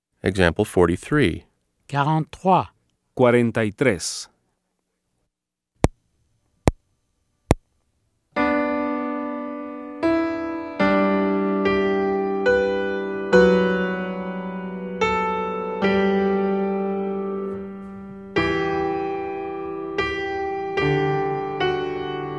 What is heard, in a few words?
Keyboard